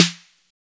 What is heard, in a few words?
Snare